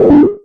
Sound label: gorilla, VIDEO GAMES; congo